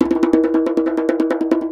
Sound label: Djembe loop 140 BPM; percussion; musicradar